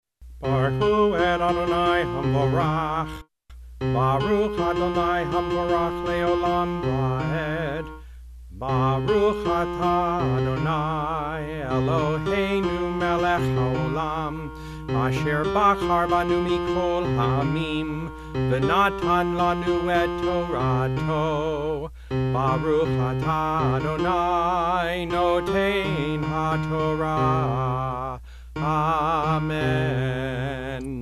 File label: piano voice